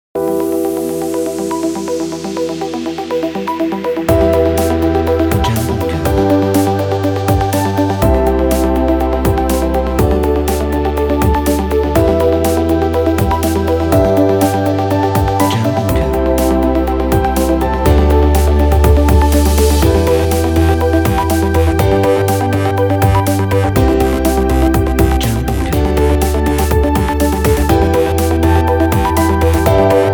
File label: Inspiration Corporate